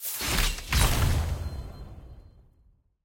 sfx mastery; eternals eog mastery 5

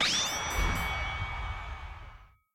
versus; tft

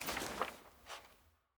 sfx ui record next click

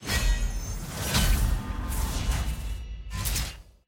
portal open outro; loot; masterwork

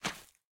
butter click

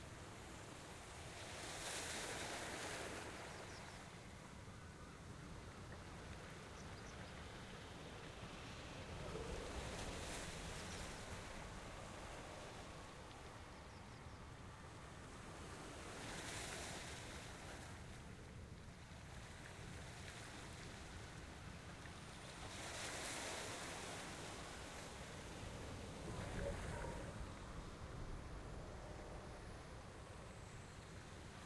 amb ionia shores

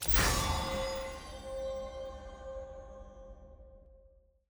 ui button click; lol; riven

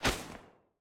miss; samira

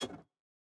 item reset

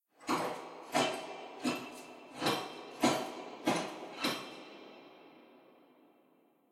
walk normal

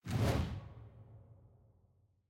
ui whoosh next

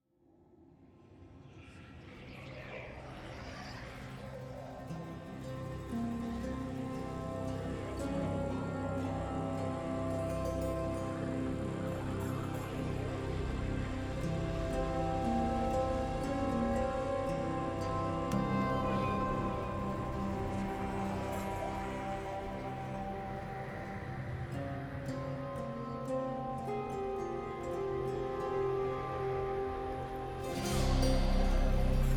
music home main; activity center

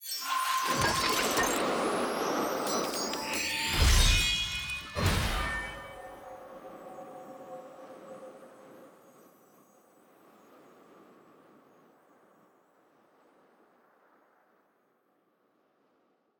capsule tier 1 ante; clash

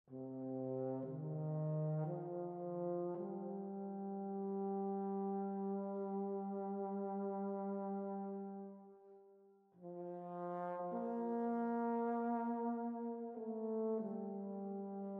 ambient music

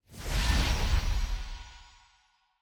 honor transition magic

